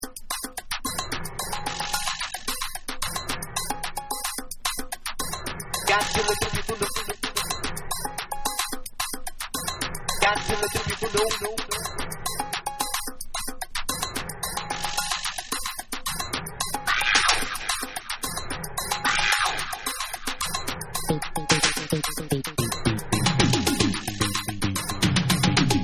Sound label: Bonus Beats